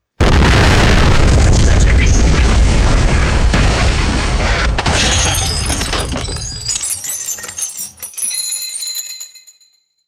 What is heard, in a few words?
vintage explosion with glass and metal debris; explosions